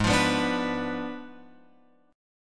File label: G sharp Diminished 7th G sharp, Guitar Open F; G#dim7 strummed; JGuitar